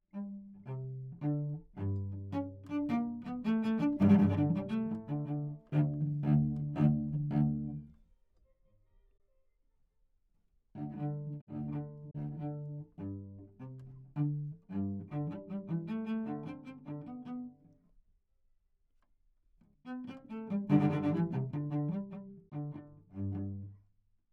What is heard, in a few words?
Minuet Cello String Quartet; Haydn; op76 n1